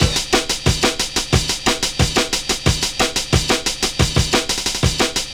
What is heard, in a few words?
Funk Break, Drum & Bass; Fluid Dynamics